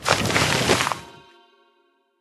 Mage Healingroots; Warmonger

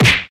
Hit Punch; Friday the 13th: Killer Puzzle

